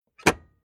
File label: Industrial Electric Switch Off Metallic; Switch Sound Effects; Switches